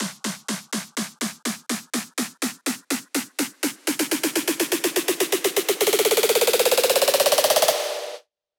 Snare Roll C 124

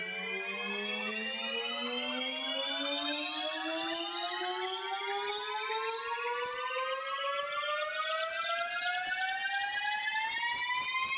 Uplifter

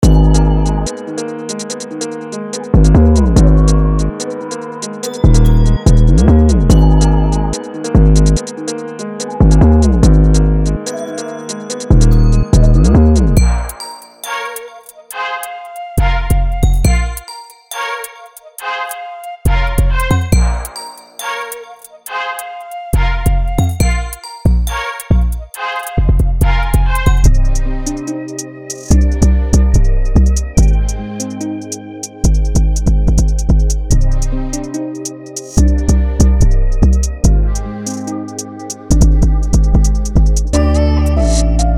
Drippy Drill Melodies

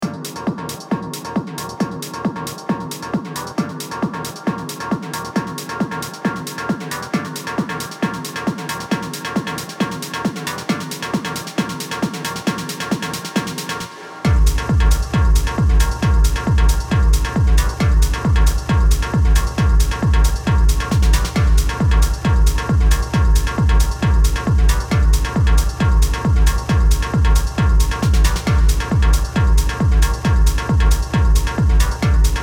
Berlin Techno; Loop Lounge

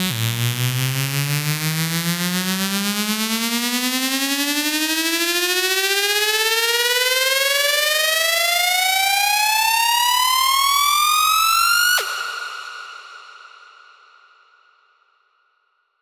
Uplifter A 140BPM

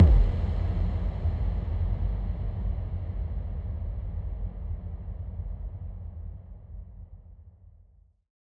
IMPACT

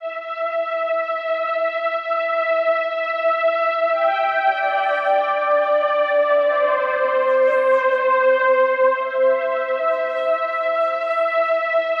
Synth Strings

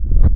Wavetable

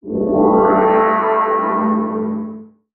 Sweep; Diamaudix Audio; PAS II

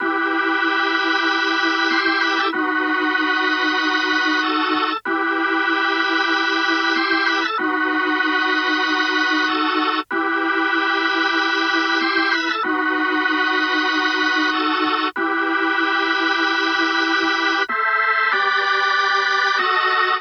Organ Em, 95

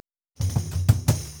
170bpm Percussion Ensemble intro

